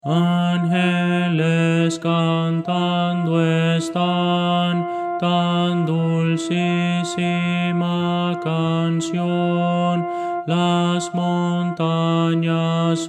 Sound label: contralto; Voces para coro